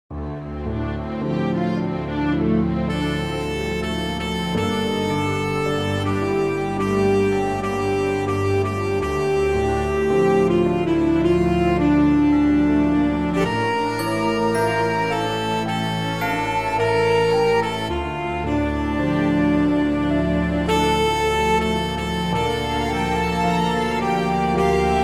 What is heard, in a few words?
Dramatic